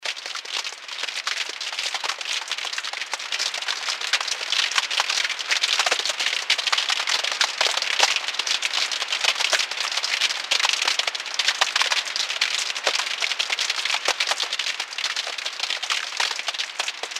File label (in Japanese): 雹